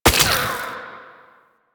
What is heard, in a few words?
Venom Burst; Warframe; Saryn